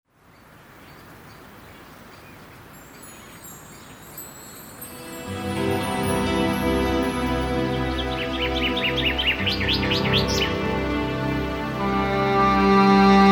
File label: SERENE AMBIENCE